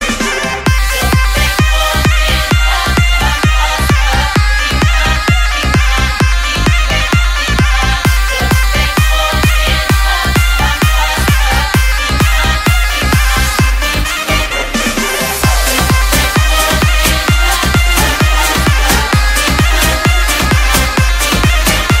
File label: Balkan beat